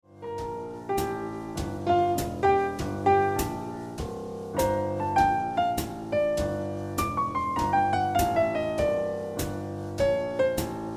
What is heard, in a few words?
Piano Music